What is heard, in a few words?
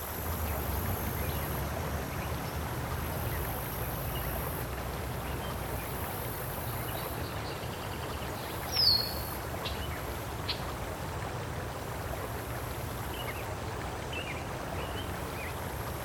amb daylight; ambi